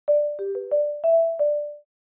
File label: Notification alert